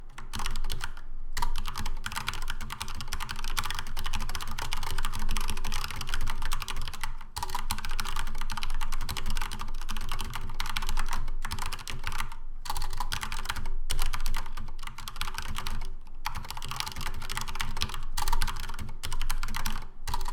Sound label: Corsair K70 RGB TKL sound sample reds